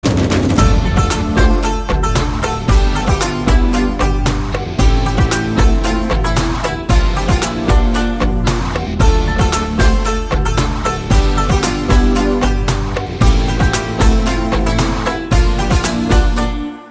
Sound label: BGM